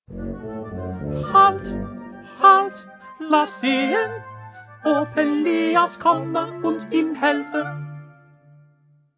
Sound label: Tenor; Bach; St Matthew Passion